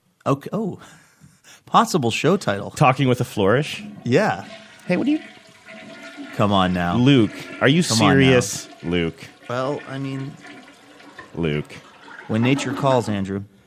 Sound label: flourish toilet flush